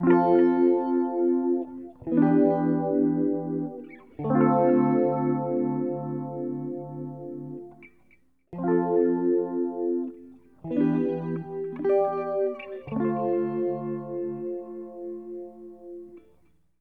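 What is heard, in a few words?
guitar, D minor, Hip Hop & RnB; ProSamples vol.34; Ballad de Boo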